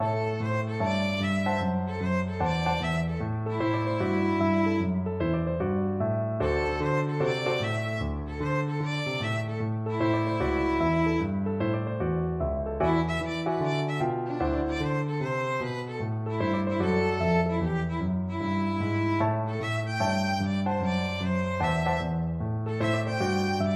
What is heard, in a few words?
violin